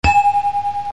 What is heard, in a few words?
Clang Sound; Music